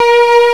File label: FAIRLIGHT CMI IIX, STRINGS